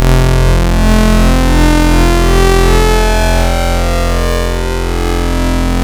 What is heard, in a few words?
Saw Harmonics; Basics; Ableton Live 12 Lite; Vector; Sprites